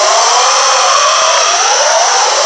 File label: Wind Loop